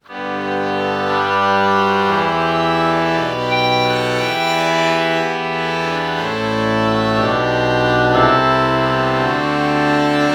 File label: oboe violin lpc true bach morph